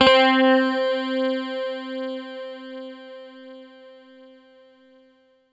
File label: C5, Synth Line; 90 s Sample CDs; NiceBeats; SynthLine VOL-32